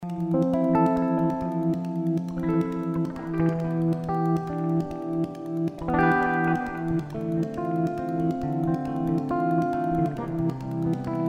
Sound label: Easy Listening